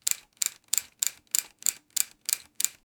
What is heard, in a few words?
sewing machine needle